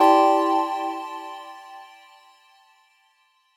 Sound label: Clear C0; Mixolumia